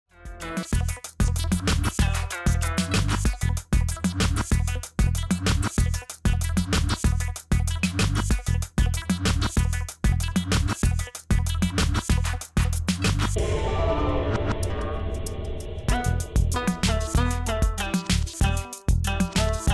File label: Precise; Waves Online Mastering Service